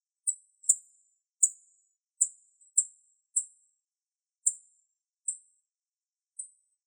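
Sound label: aoji call, black-faced bunting; jinaki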